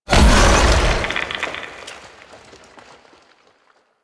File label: Half Life Impact; Warframe; Warframes; Qorvex